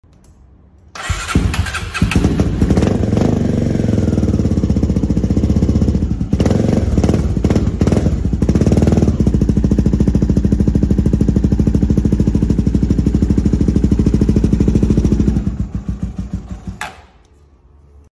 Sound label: Tornado exhaust